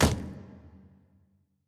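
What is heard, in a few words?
ambience room polygon punchbag training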